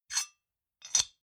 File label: Bar Whiskey Glass Liquor Decanter Open And Closed Light Glass Rattle; Drink Mixing Sound Effects